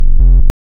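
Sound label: waveform FM triangle triangle silence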